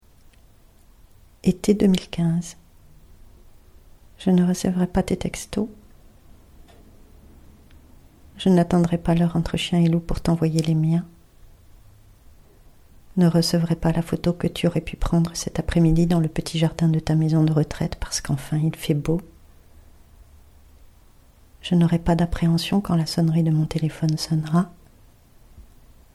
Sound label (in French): voix seule